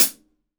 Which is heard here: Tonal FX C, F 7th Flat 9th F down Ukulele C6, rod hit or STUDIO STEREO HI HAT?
STUDIO STEREO HI HAT